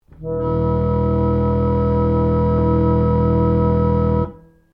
ORGAN PUMP BASSOON E2 OCTAVES, Old portable church organ; Keyboards; Musical